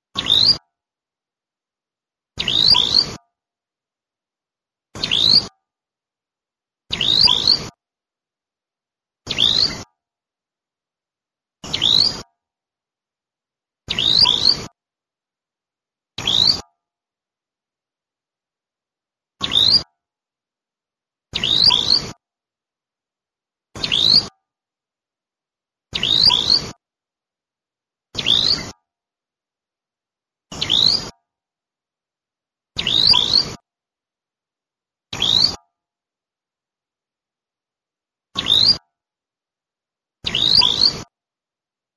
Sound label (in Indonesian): suara panggilan prenjak kepala merah